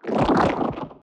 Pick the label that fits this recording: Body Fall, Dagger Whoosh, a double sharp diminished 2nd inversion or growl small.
growl small